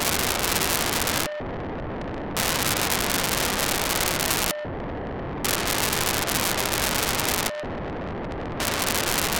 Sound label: FM AUDIO; GreenCube